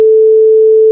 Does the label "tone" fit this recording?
Yes